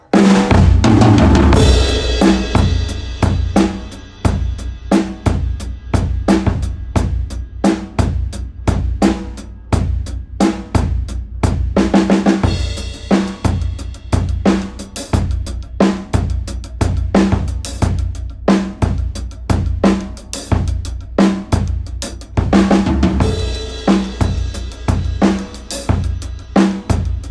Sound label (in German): Schlagzeug